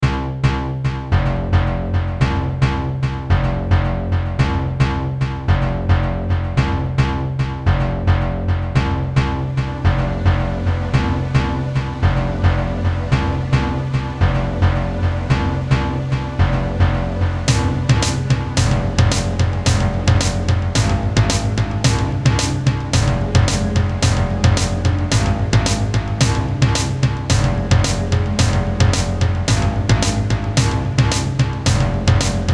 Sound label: Synth Race